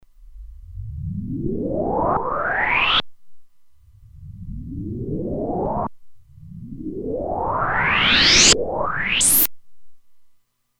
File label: Back Sweep, Roland Alpha Juno-2; Factory 2